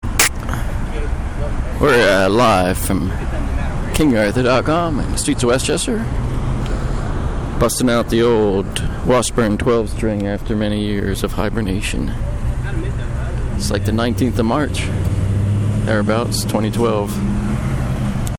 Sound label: acoustic guitar street music, washburn street jam intro; washburn west chester